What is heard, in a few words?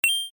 coin